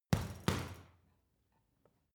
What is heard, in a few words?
boxing punching bag side hook double hard; sport freizeit; boxen